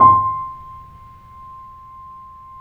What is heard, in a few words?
C, The Piano